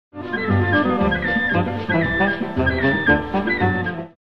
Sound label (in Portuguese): cornetinha; Excerto musical; choro